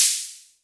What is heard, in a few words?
Hats, Elektron MachineDrum; SPS1 MKII